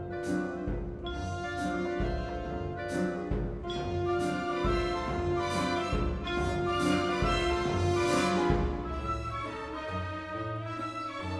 jazz

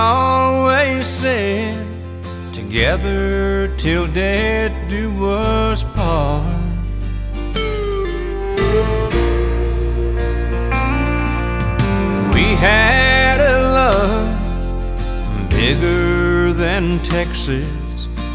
country

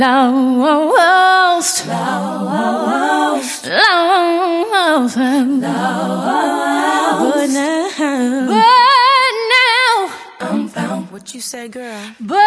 pop

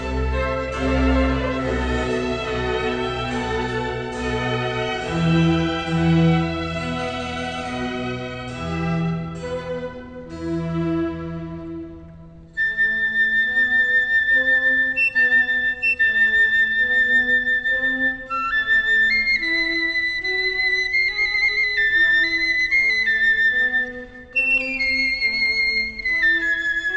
classical

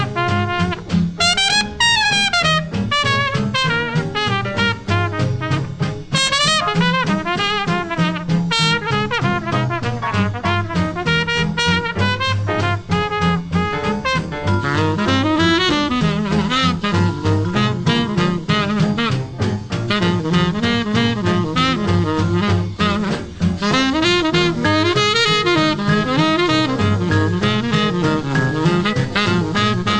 jazz

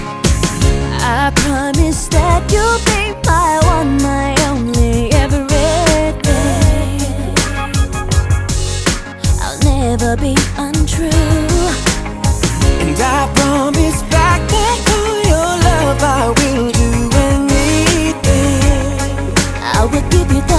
pop

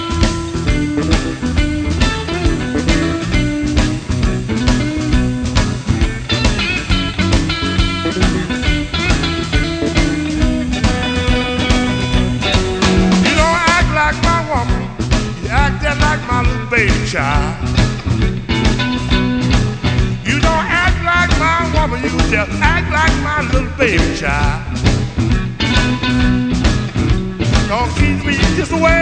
blues

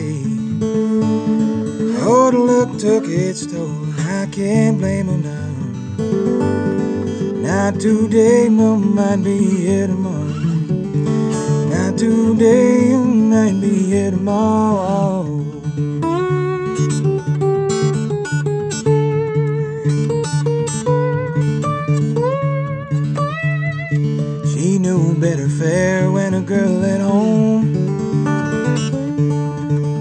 blues

